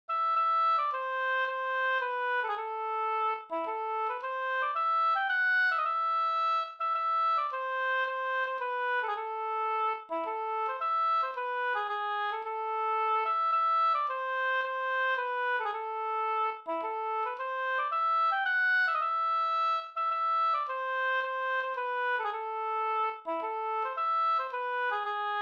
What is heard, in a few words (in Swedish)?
polska; Hook Olle e Elsa Uhr SvL Da115